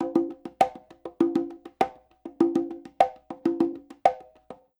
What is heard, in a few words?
Conga C, перкуссий funk; сэмпл перкуссий